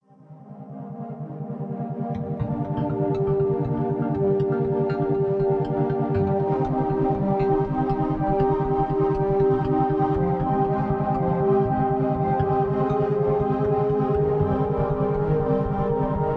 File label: Cognition Enhancer For Clearer and Faster Thinking - Isochronic Tones